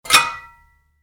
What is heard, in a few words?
Shovel: Pick Up; Yard Tool Sound Effects; Yard Tools (Sound Effects:Tools); Spade pickup; Stuart Duffield